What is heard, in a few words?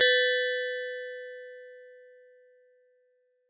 Metal